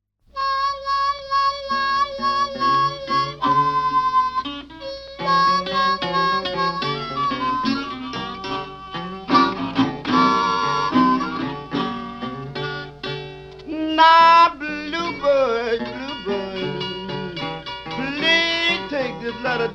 Blues

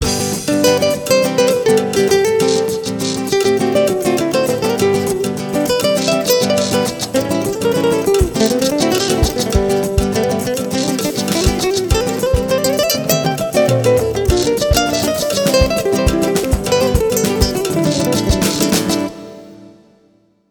Only Solo, Happy Guitar Instrumentals

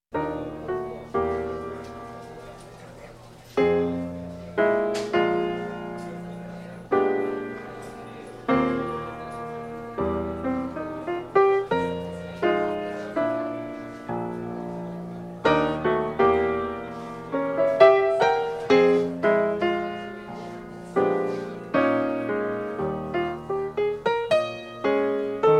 Solo Piano

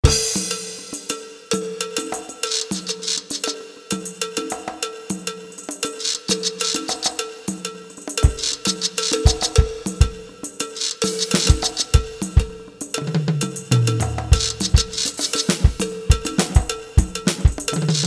Only Percussions

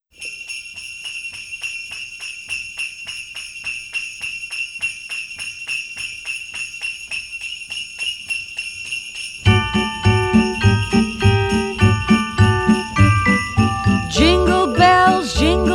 Jingle Bells

